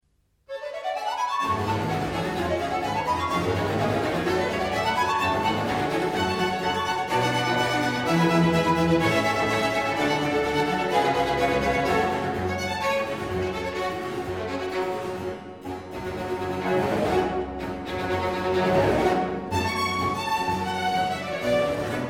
Concerto for Flute, Strings & Basso Continuo in G minor; Op.10 No.2 La Notte; Fantasmi